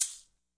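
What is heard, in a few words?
classic hip hop shaker; STYLE KITS; Classic Hip Hop Mega Kit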